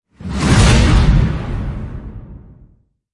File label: Thunderous Wipe; Ninja Tracks